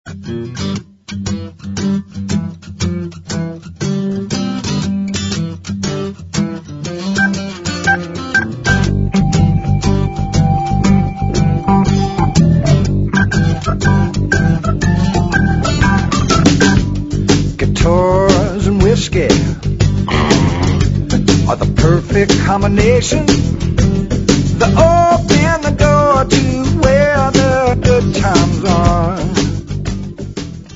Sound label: Guitars